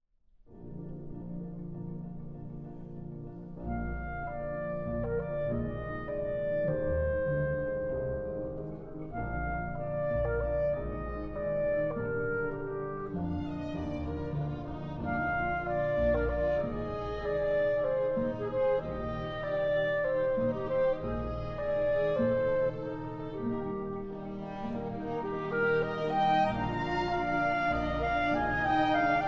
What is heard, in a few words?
Concerto for Clarinet and Orchestra